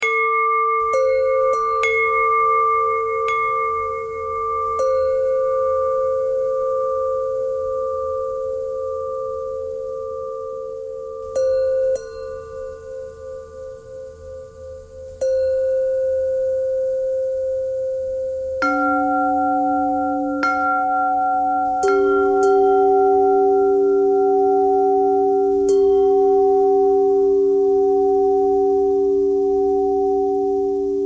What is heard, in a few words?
bell thread pentatonic